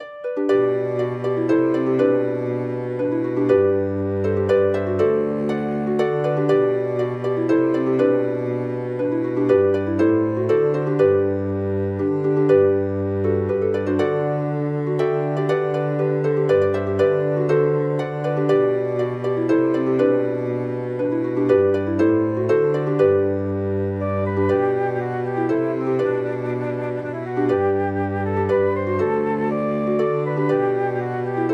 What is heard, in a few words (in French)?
pour harpe flute et violoncelle; anonymous l alouette dans l air clair